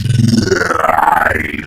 Alien Spectral; Synthetic's Serum Collection

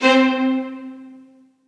Viola Spic C4; Aubit; ChainPop Vol. 1 Noises

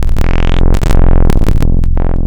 Thick Jumps; Synthetic's Serum Collection; Echo Sound Works - Modular

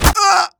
pain, player; Team Fortress 2

